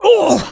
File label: Male Hurt; Mars Sortie